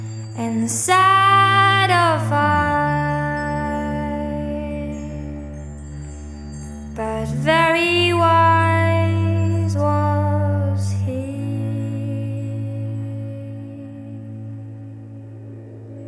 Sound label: Acoustic